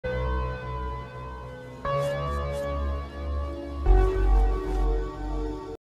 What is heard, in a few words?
disappear sound; nilesey niles